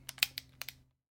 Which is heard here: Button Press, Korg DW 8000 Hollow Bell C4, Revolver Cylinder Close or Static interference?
Revolver Cylinder Close